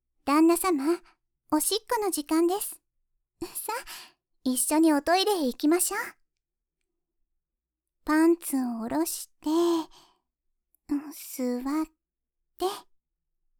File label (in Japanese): おしっこ 5回目